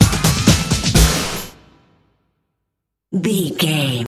Dance Your Way Out Sting, Dance, EDM, Club, Exercise, Aerobic, Aerobics, Spinning, Workout, Disco, Eurodance, Confident, Energetic